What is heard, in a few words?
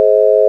sine; 音の波形と分析